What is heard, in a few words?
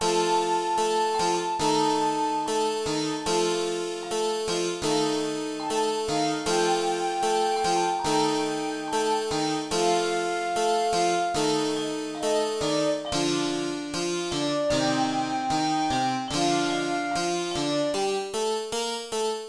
holiday easter